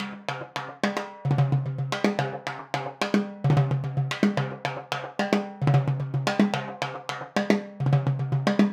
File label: timbales loop 110; perc construction loops; SEISMIC Drum Sample Pack; Slate